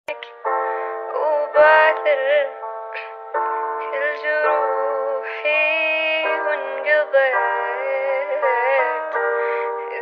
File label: Arabian Sad Remix